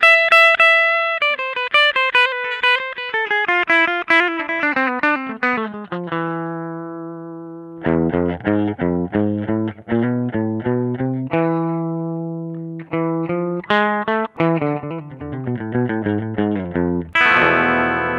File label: clean strato talla; v-twin